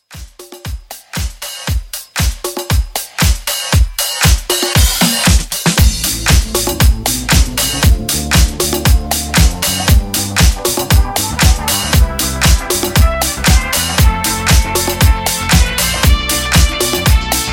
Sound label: 70s Disco Re Drum 117